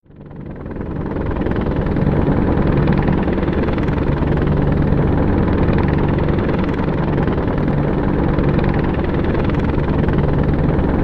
Apache Heli Hover; ah-64 apache

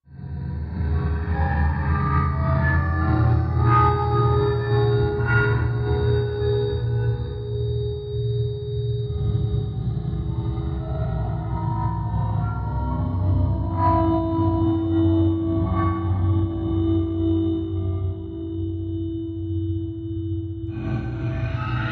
Dist Metal Machine Amb, metallic

